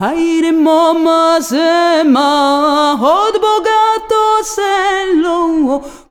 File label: VOICES; USB Soundscan vol.63; AKAI